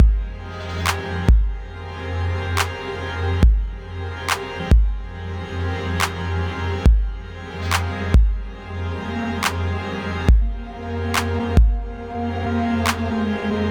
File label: Beat, Trap hi-hats